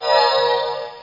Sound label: magic; Wizkid; Ocean